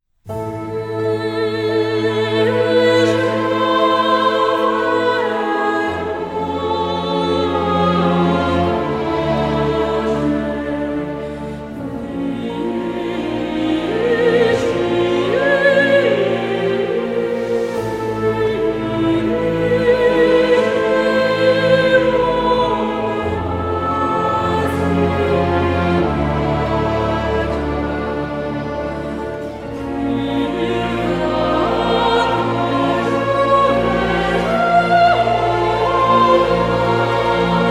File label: Siren Song; Tiragarde Sound